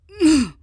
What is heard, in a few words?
Godseeker Ground Hit